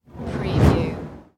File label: Fire Torch Single Pass By Whoosh Slow